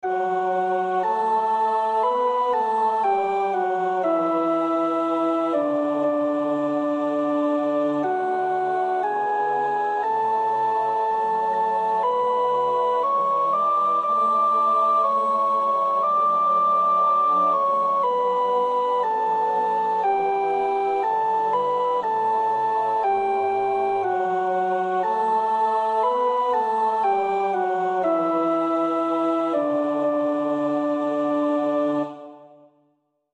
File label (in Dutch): Ga met God en Hij zal met je zijn (sopraan); Nieuw Liedboek; NLB 416; Oefenbestanden